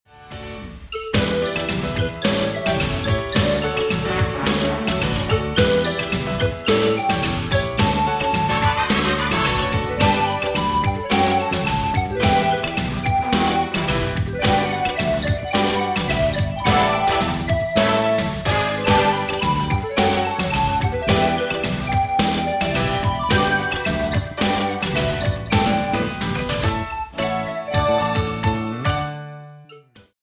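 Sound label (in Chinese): Karaoke, 兒童詩歌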